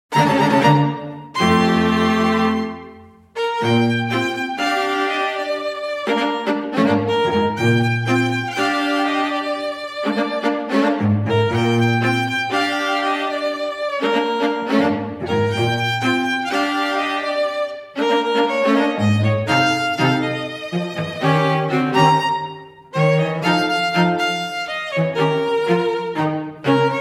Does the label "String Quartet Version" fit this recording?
Yes